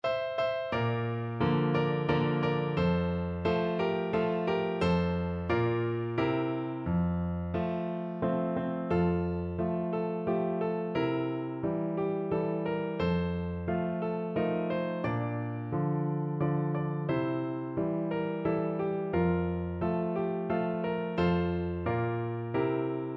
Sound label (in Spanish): las mananitas, piano